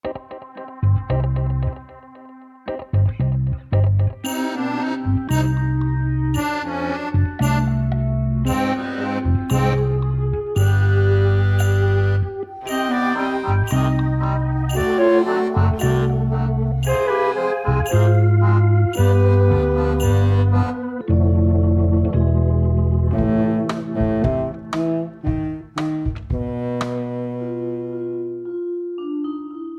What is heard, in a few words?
Bass; Return to Monkey Island; Leship-Lowerdeck